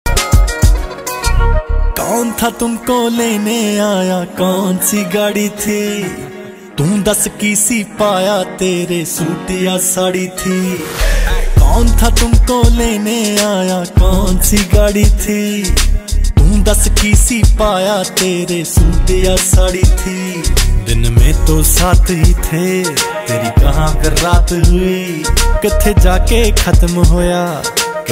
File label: Dance Punjabi Ringtone